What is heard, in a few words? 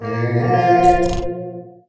hero beastmaster summon boar